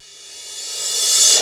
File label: Reverse Crash, Cymbals; Vengeance Samples